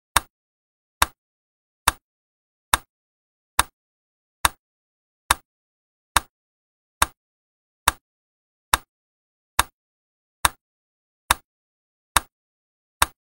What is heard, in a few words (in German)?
Metronom; Suedpol